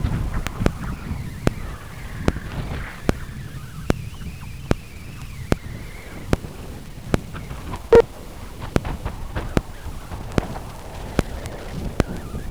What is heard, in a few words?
Vostok mag; arctic antarctic